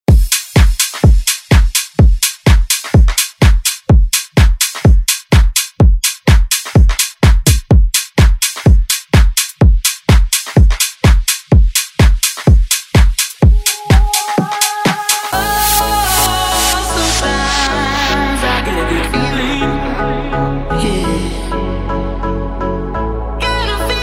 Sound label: House Redrum